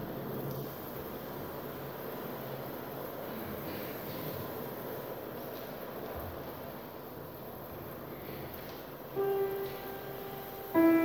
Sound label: Choir